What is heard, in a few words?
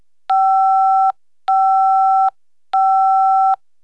cell dial, cellular; KABOOM! - Wild and Wacky Sound Software; citylife